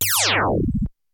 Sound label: SPACE GUN; Rubber Chicken Software Sample Bank; CYPERPUNK 2